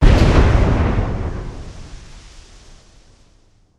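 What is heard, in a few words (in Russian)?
взрыв бомбы сыпятся осколки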